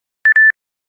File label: Beep twice